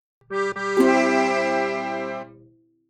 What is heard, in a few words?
game success fanfare short; UI Sounds